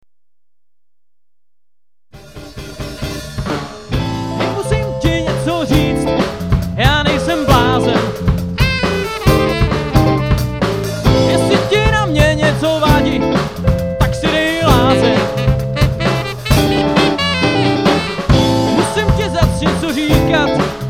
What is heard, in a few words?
funky